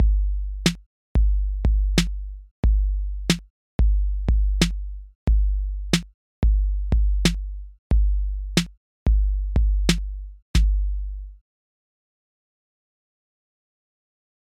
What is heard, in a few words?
Beat